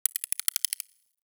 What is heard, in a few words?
Foliage Insect; Ambience; Props; Warframe; Duviri